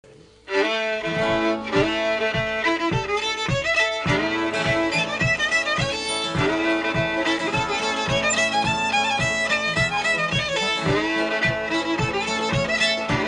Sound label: guitar; Boatin Up Sandy